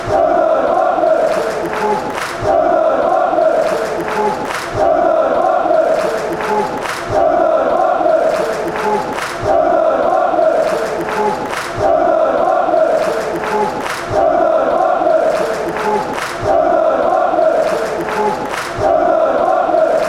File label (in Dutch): lokeren zingen